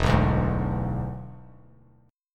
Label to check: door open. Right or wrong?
Wrong